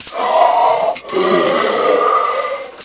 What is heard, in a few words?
tank idle